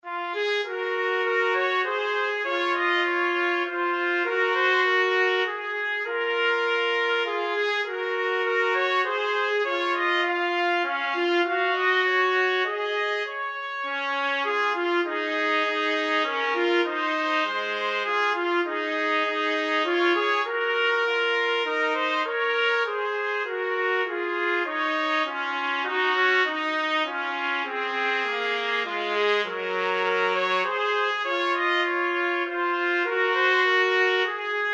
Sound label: holst jupiter 2 trumpet; trumpet duet